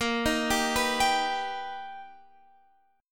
B Augmented Major 7th A sharp, chord

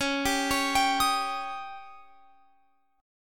Db Suspended 2nd Flat 5th, Guitar Open F; arpeggiated

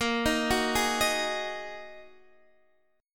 Bb 7th Suspended 4th Sharp 5th Bb Guitar DADGAD; x,13,13,11,11,13

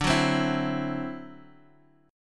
D 9th D down Guitar Open G

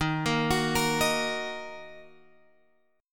D sharp Minor, Guitar, Open G

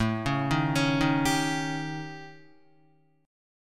A 7th Flat 5th, down, Guitar; A7b5 arpeggiated; JGuitar

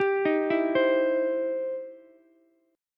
E Minor Major 7th Sharp 5th, E, down, Ukulele; EmM7#5 arpeggiated

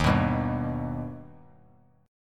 D sharp Augmented D sharp, Bass Standard; strummed; x,6,9,0; JGuitar

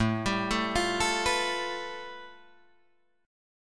A 7th Suspended 2nd Sharp 5th A, Guitar Drop D; chord

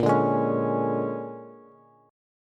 Bb 7th Sharp 9th Bb down Bass; Bb7#9 strummed; JGuitar

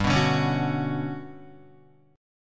G Major Sharp 11th, Guitar; Listen to GM#11 strummed

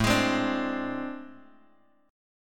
A Minor Major 11th, Bass; strummed; JGuitar

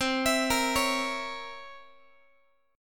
C 7th Flat 9th Guitar; arpeggiated; x,20,19,20,17,x; 4A,4E,5A,5D,5G#,6C#; chord; JGuitar

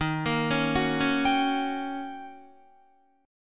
Eb 7th Sharp 9th Eb, Guitar; Eb7#9 arpeggiated; 11,13,11,11,13,14; JGuitar

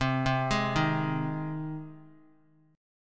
C Minor Sharp 5th C down Guitar; arpeggiated; JGuitar

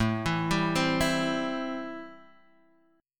A-Minor 7th A; x,0,2,0,1,0; Am7 arpeggiated; JGuitar

